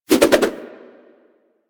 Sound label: Nunchaku Spin Whoosh; Warframe; Tenno